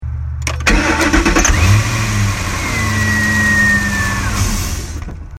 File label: clicking noise over bank 2 front